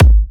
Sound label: Kicks G#; markus hakala